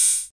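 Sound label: Korg KR-55 hi-hat open; drum machines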